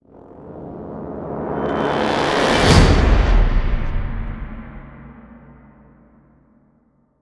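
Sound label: sting, brass swell; transformers war for cybertron gamerip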